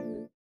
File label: VIBRA; Spectrasonics; Metamorphosis